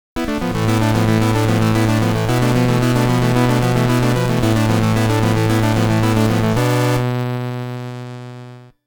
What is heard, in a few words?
ROUND CLEAR; HuCARD Disc In Taito vol. 2